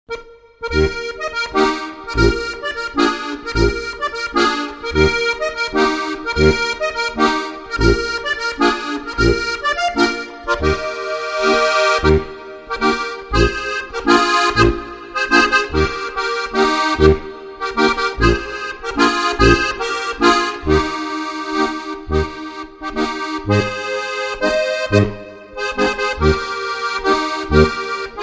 innviertler landler steirische harmonika; Gstanzln

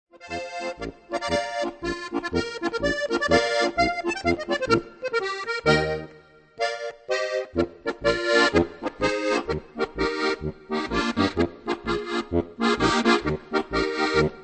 pfiff polka; Typisch Steirisch

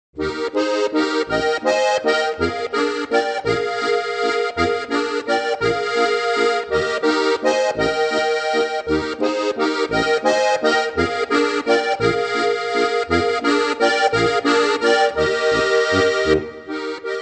walzer; Mein erstes Spielbuch

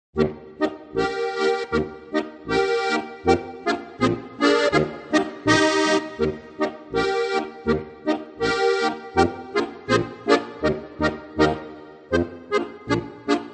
boarisch

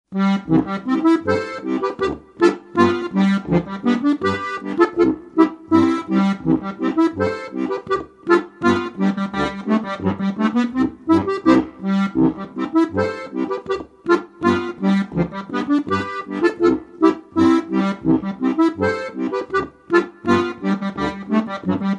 polka

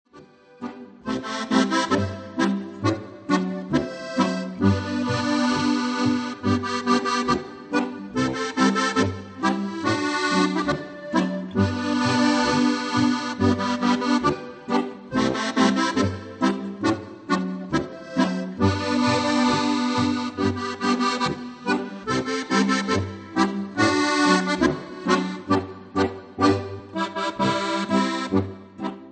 boarischer; Harmonikastückl aus Bayern

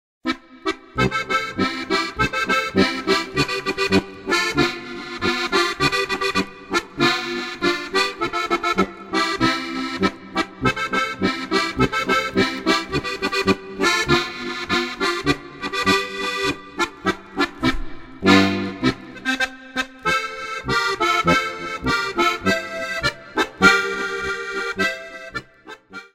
polka

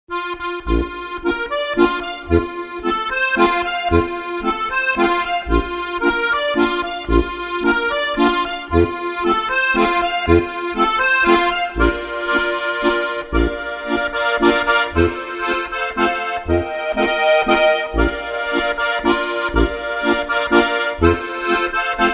steirerlandler steirische harmonika; Gstanzln

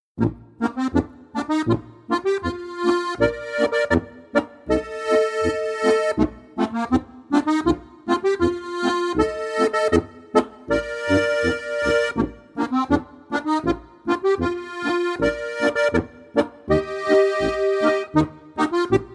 boarischer

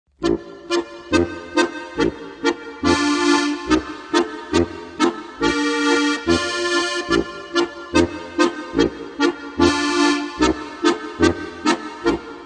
klatsch polka; Volksmusik

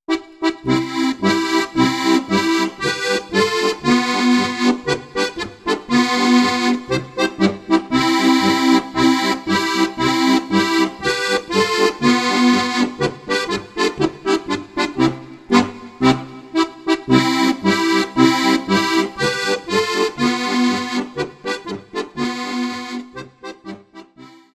polka

